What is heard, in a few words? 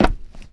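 player metal, footsteps; csgom; cstrike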